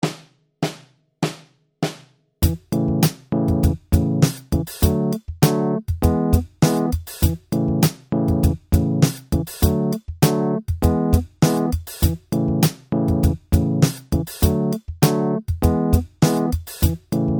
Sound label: bass play along